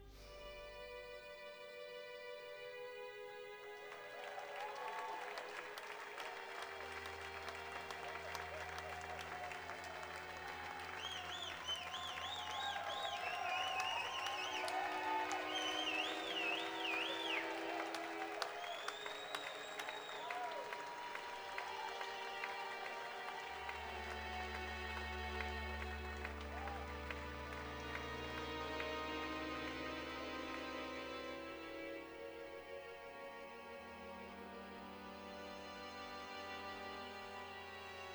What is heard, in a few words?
Threshold Soundscape